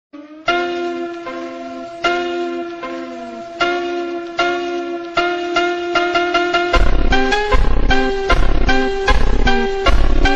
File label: Ringtone; Tamil song